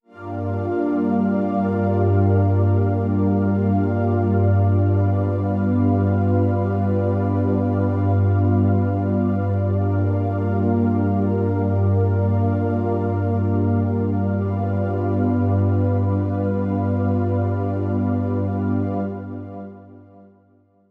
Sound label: Drone Pad